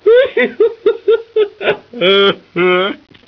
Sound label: haahaa